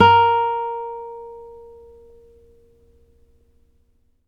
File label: NYLON F A#3, GUITARS; Synclavier Sampler Library